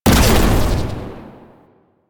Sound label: Shotgun Shot Fire; Weapons; Warframe; Pax Duviricus